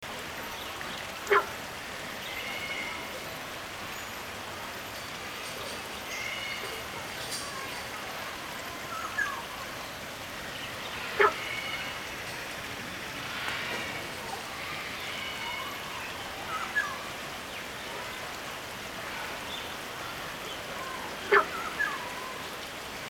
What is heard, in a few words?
Hylarana guentheri calls